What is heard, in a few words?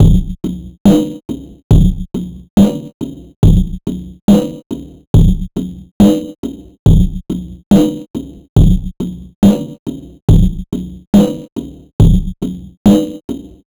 140 Halftime Slurp